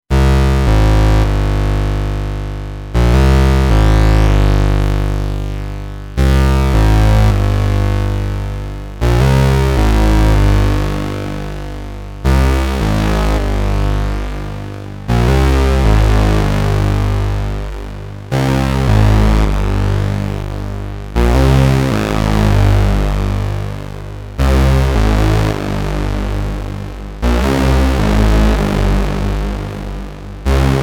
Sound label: Lancet 2pwm